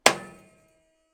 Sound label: Submarine Lever with Spring